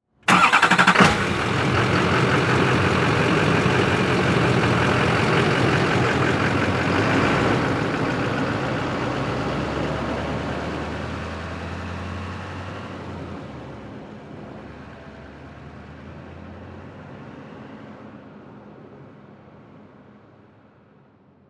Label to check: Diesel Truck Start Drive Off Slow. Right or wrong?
Right